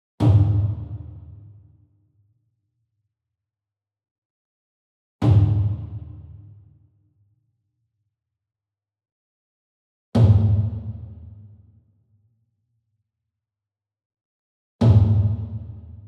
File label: SINGLE DRUM Kodo Hit Soft Beater Far; boom library; Cinematic Strikes Construction Kit